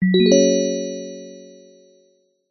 multimedia alert bell chime event notification

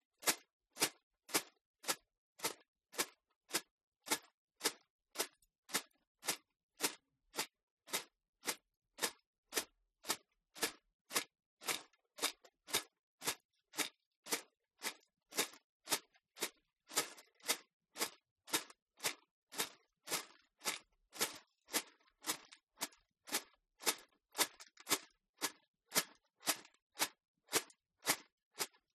ARMOR Troops Metal Clatter March; FOLEY; smartsound

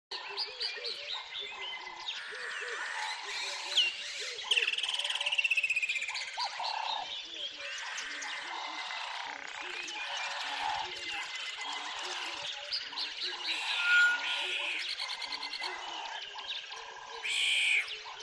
ATMO NATURE Tropical River Lively Wildlife; smartsound

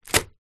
biscuits packet place down on table

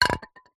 industrial can wd40 set down floor

